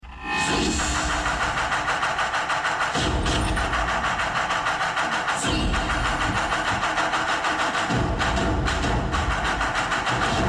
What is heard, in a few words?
Back Ground; Dammu